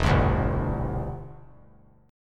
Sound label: E Minor 7th Sharp 5th; strummed